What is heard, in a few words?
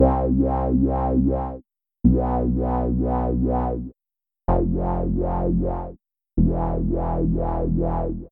subtractive synth; Max